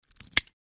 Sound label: Large metal padlock shut click close; locks keys